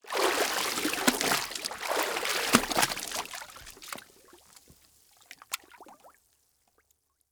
Liquid Water Towel Dripping And Dunking Under Water